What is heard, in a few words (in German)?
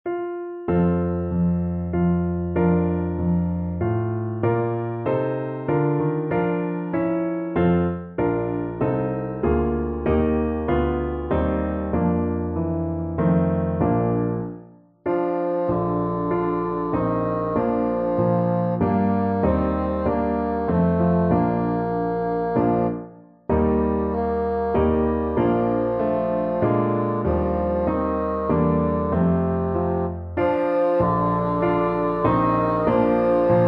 Fagott Solo + Klavier-Playback; Ich bete an die Macht der Liebe